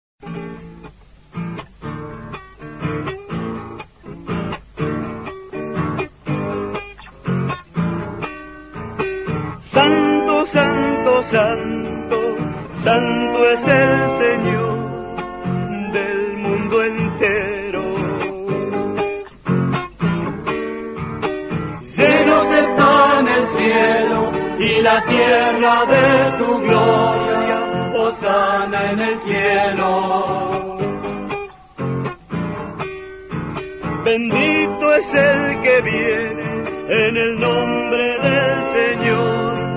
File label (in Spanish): Santo; cantemos hermanos con amor plegaria eucaristica